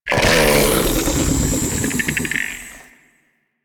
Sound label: Infested Drop Pod Emerge; Warframe